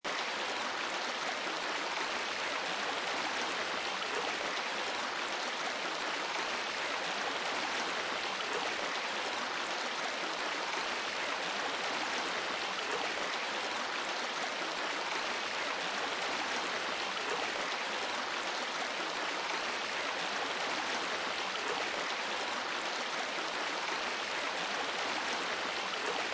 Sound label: flowing water, river